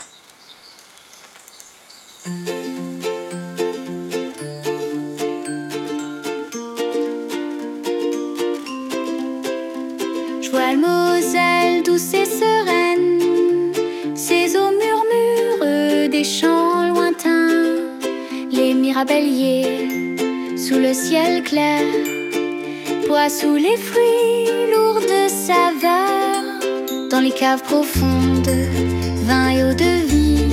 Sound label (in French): ENSEMBLE VOCALE; JEUNES DE LORRAINE